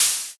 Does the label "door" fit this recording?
No